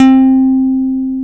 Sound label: C 3, PICK BASS; AKAI S-Series CD-ROM Sound Library VOL-7